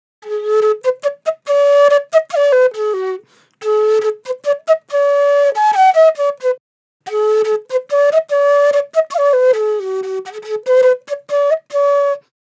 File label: Drill Flute Loop BPM 141 G Min; Gully Loops; flute melody bundle